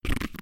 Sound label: fart